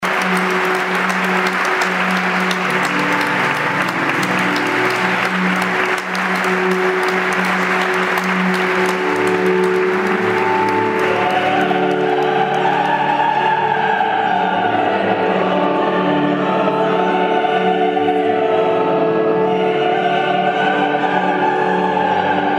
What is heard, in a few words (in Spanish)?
Himno a la Alegría Coro de Cámara y Órgano; toma de posesion monseñor Hugo Norberto Santiago